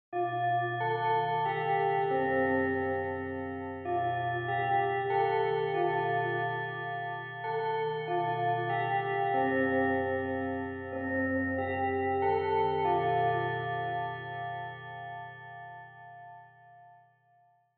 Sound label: Japanese School Bell (Far-Fast-Long)